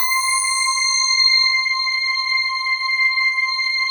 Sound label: PAD, DIGI-PADS